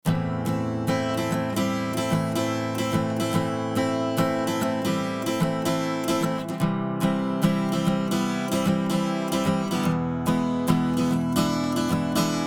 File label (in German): Gitarre Slow; Rockruepel Comp.Two V2